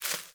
Sparrow Quick Move, Movements; Animals

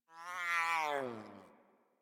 Catbrow Kitten Yawn; Warframe

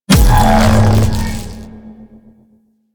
Sentient Aerolyst Shield Down; Warframe; Sentients

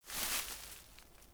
Surface Body Roll Vegetation; Player; Surface Body Impacts

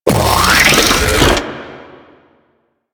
exploding arm charge; Warframe; Man In The Wall

